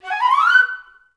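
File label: flute run up F#4; Best Service; Peter Siedlaczek's Advanced Orchestra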